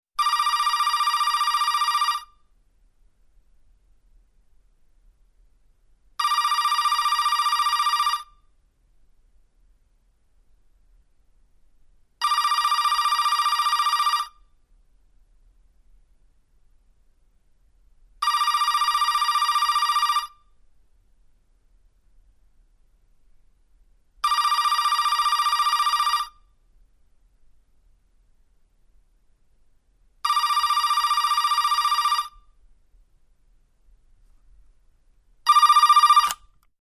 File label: Telephone Ring Prin, electric